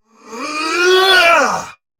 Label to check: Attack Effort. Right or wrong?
Right